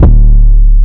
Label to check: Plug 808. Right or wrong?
Right